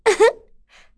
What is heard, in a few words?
Laugh; Yuria; kingsraid